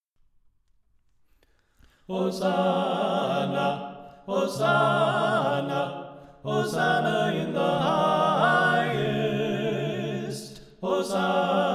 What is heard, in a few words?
Alto Left